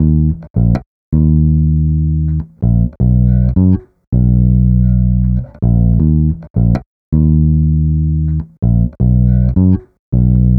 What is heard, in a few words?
Bass, Electro Lounge; Sonic Foundry (Sony Creative Software); 080 Pinky